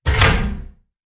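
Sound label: Hit Organic Spring Loaded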